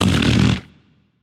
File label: nv tongue; smite; hachiman